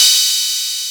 Atlantex Music Percussion Computer Cymbal Choke, drum machine